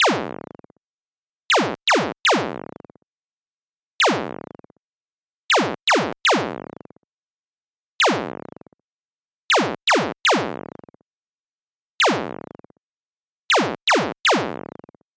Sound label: Laser gun